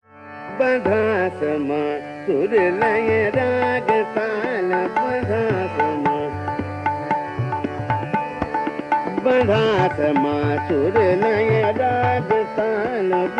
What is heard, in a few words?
Vibhas (Marwa Thaat) D. Trital; abhinav geet manjiri; Bandha Samaa Sur